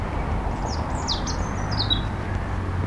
birb

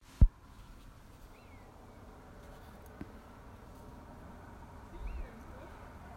Buzzard over the garden

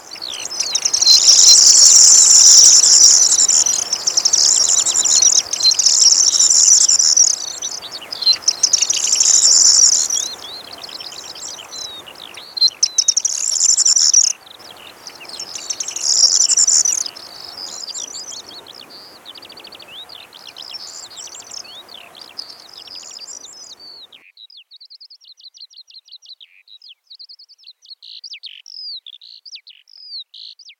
corn bunting song declining

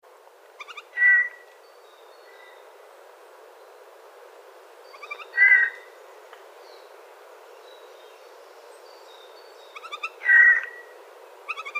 Eurasian Jay Garrulus glandarius

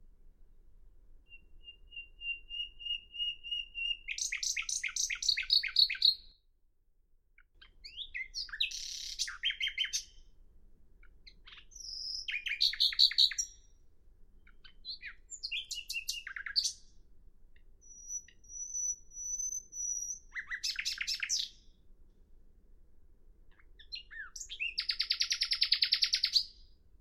nightingale song short

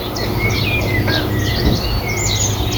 Bird Call

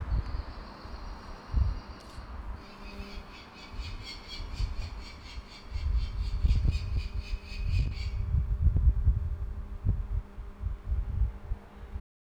Mystery Bird; Pitt Rivers

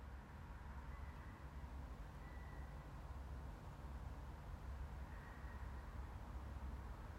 Owl

